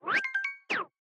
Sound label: Equip Item